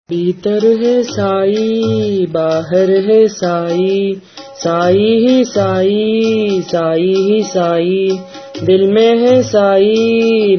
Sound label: Bhajan; Bheetar Hai Sai